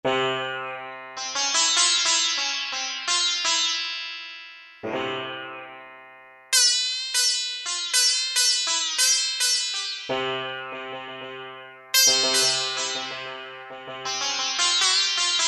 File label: Sitar, Yamaha TG500